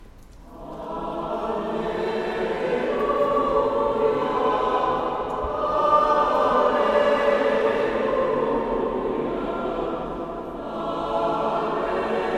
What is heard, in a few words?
Alleluia celtico